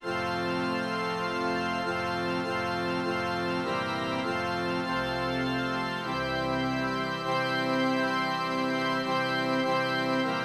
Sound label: organ